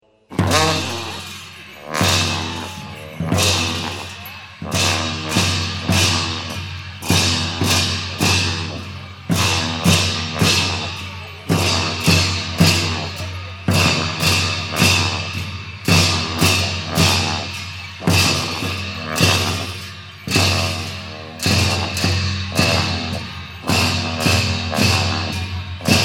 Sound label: dance